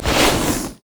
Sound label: basicattack; Ziggs; snowday